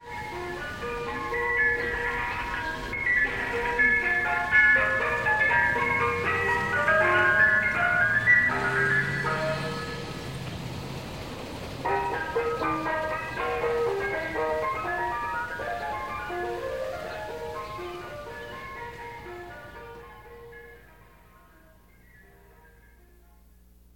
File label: Ice Cream Truck